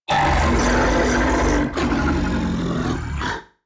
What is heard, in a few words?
Octopede Taunt; Warframe